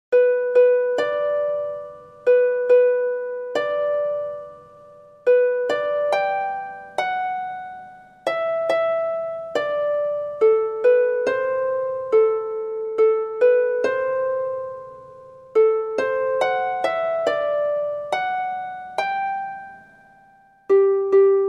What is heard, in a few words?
Brahms Lullaby key G Major